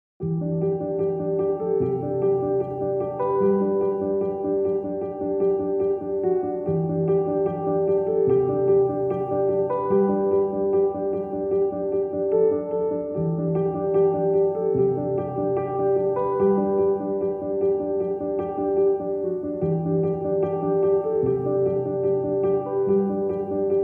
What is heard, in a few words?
Soft Piano